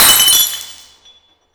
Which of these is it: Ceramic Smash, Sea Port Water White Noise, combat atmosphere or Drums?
Ceramic Smash